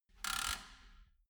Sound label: nut runner wheel change